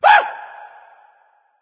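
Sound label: nonverbal surprised; smite; voicepack; ullr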